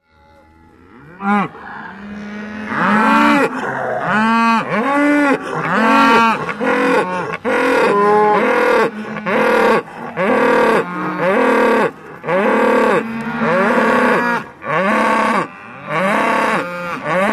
Cattle, Cow Bellow. Group Bellows In Distress, With Bellows And Bull Urinating In Background. Close Perspective.